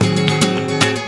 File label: GUIT GROOVE; Best Service; World Colours